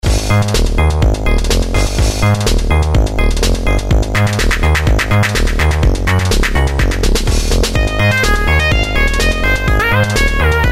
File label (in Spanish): tono amiga; pinball fantasies; 1992